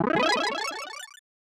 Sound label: Power Up